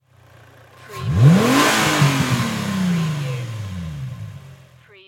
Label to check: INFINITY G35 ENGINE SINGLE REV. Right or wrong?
Right